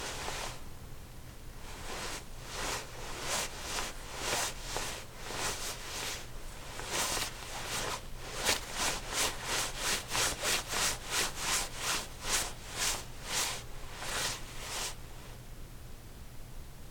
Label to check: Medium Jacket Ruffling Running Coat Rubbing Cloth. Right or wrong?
Right